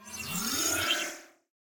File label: Pendantglow; super mombo quest